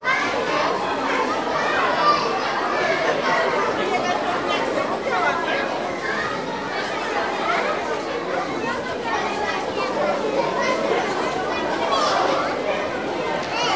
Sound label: Kindergarten